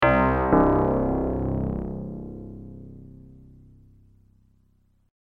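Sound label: dx7 percussion like; 'Dulcimer' patch (dry record).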